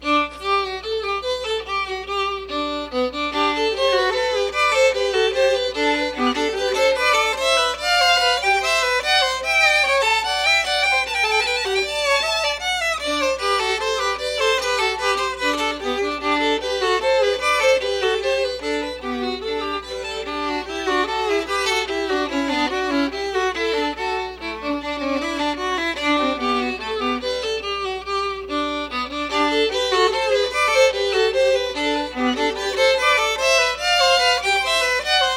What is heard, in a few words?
bourree violin duet